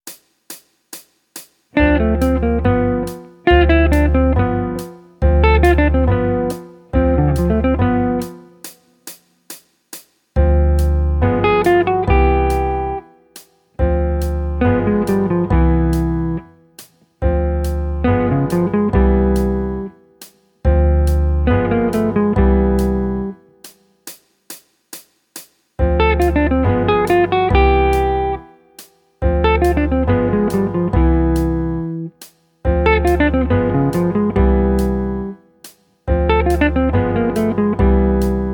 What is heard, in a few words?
Jazz, Guitar; Guitar Techniques 2019 Issue 294 Spring